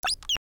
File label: Broom Bot Sound, creatures mechanical